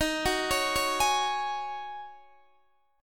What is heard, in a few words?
D sharp Minor 11th D sharp Guitar Open D, chord